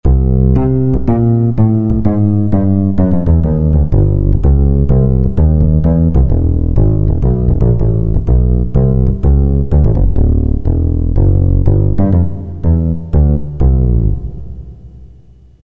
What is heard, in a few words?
Acou Bass, Roland MT-32